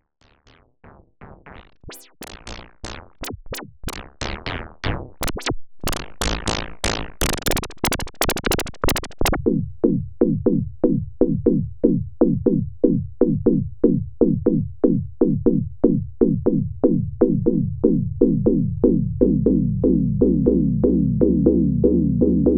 Roland SH 01A Stab

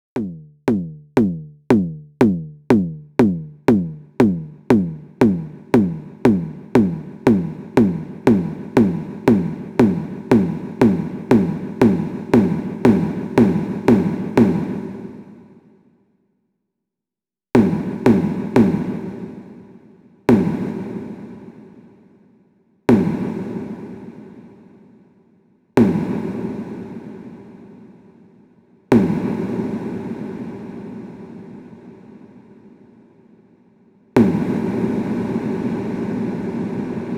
Elektron Digitakt Reverb

